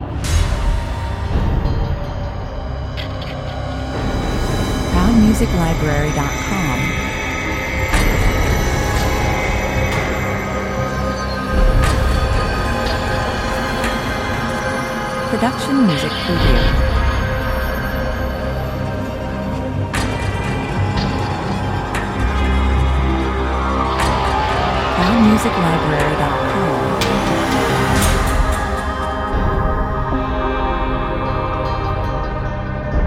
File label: western scifi intro 60 bpm